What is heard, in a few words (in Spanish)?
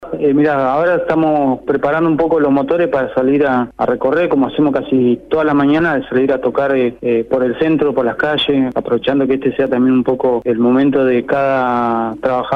TOCANDO EN LAS CALLES